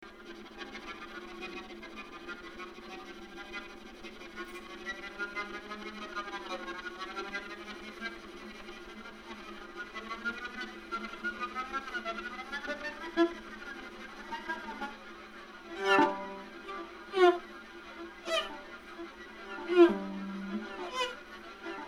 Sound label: gliss; Massing